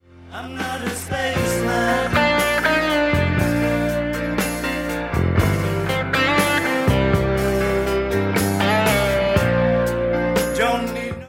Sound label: leslie